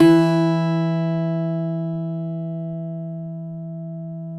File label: CUATRO; Spectrasonics; Hans Zimmer Guitars VOL-2